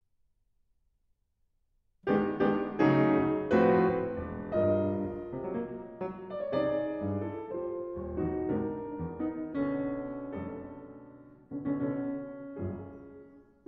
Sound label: Piano Suite, Menuetto